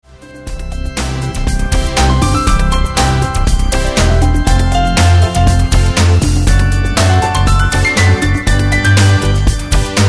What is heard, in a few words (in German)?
Kleines Praeludium und Fuge in C-Dur fuer Orgel